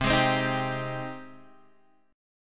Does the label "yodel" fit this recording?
No